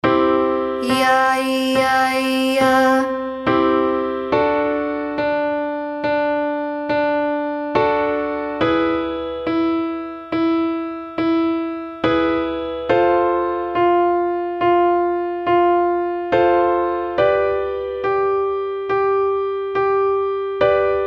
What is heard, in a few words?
Single Note Ya Ya Ya